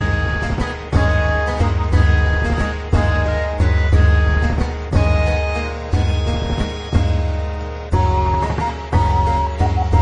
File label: castle song